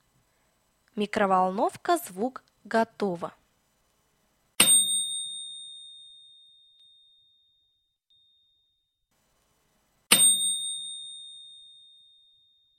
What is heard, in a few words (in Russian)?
Микроволновка готово